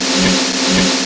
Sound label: P SN, DRUMS; CMP - Analogon